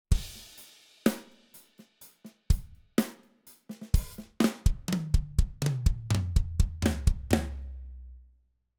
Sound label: gated and aligned; Oxford Drum Gate 2; Sonnox